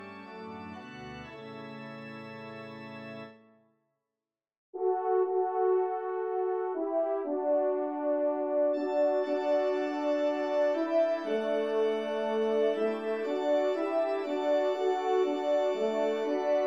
Elevation Trp Org; messe cantin

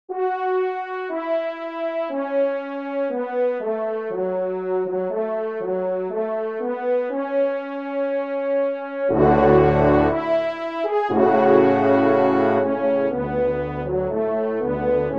Sanctus, trompe, BASSE; Teknia; ND Strasbourg; Sanctus à ND de Strasbourg Hervé Teknia

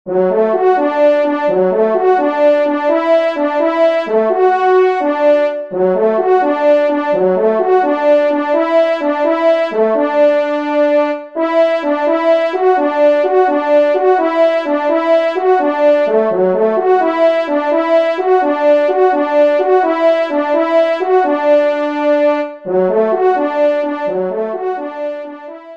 Allegro de chasse, trompe; 1e Trompe

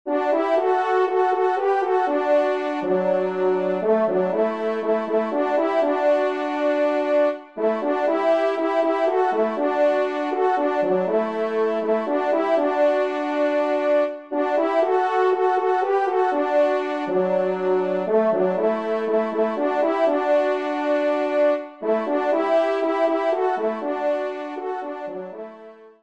TRP; A Pierrelaye; 2e Trompe

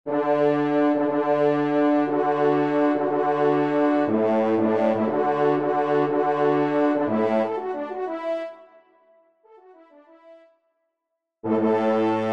trompe; Helene a Mme Minie; E Caneaux

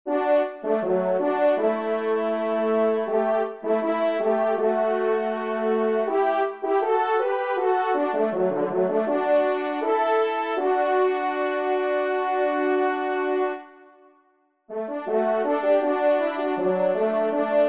trompe; Le Tonnerre à la voile

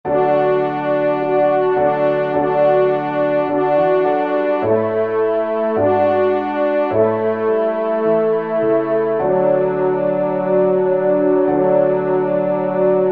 Trp et Pia; Kyrie; Messe d'Estival

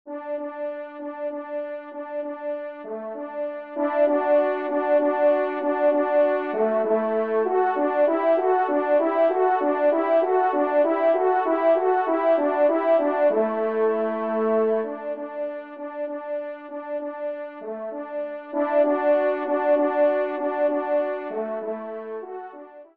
Fanfare Do Maj TRP; 3e Fanfare; Corrette

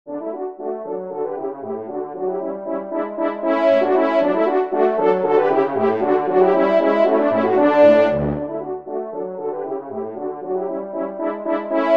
Trio Cors

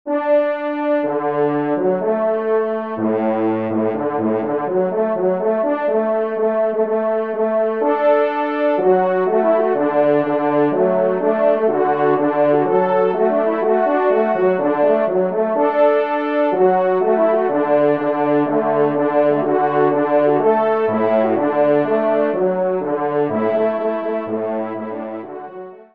Marche des Veneurs, Do Maj, trompe; P. Lauge

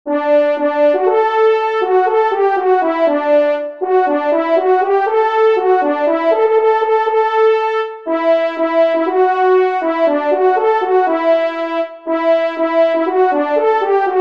Retraite, trompe; Retraite des Chasseurs; 1e Trompe

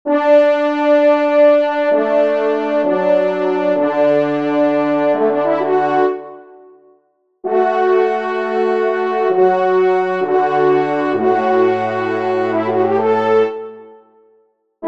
polka, trompe; Ermeneux; 3e Trompe

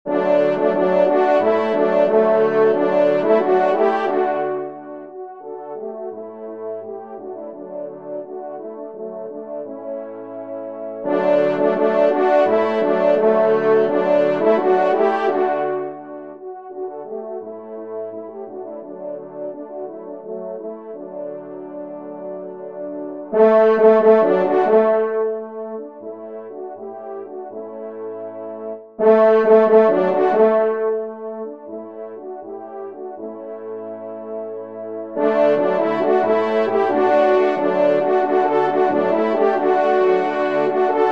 Fanfare, trompe; Fanfare d'Auvergne

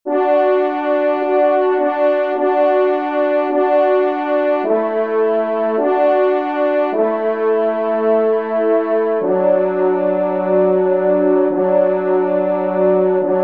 trompe duo; Kyrie; messe d'estival

